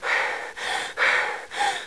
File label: F Out Of Breath